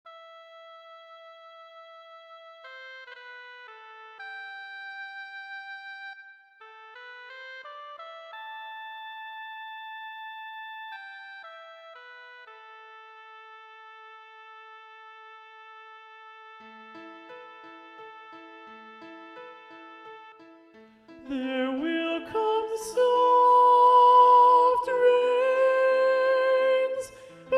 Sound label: There Will Come Soft Rains SSAA Soprano 1 Predominant